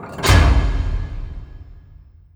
Impact Metal; HapticSamples; Feel; NiceVibrations; Impacts

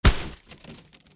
Wood, Impacts; HapticSamples; Feel; NiceVibrations

